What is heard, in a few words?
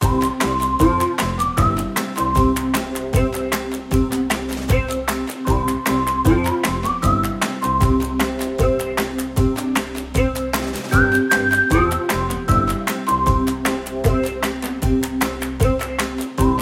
Happy Clapping Whistle Loop; MIUI14; HyperOS